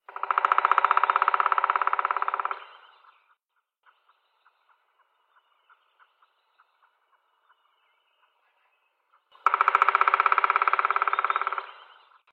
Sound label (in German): Schwarzspecht Trommeln